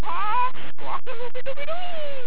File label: squawk; Tampines